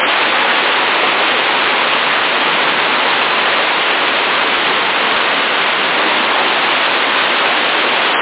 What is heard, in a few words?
Nature rain light